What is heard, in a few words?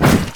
monster; cdogs